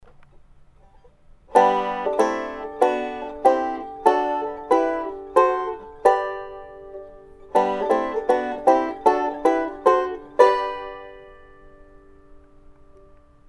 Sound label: key g harmony